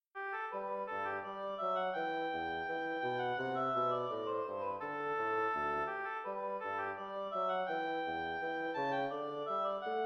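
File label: gavotte in G major oboe bassoon; handel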